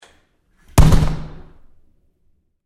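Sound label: Door Bathroom Stall Close Close Up Hard; Household; Big Room Sound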